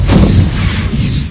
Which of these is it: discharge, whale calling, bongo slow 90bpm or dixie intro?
discharge